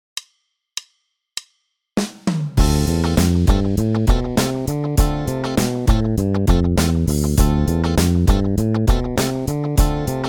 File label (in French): mode dorien, basse; exercices